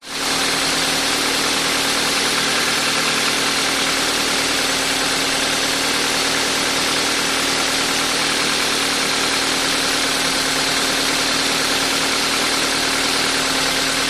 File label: vehicles car engine idle open hood engine perspective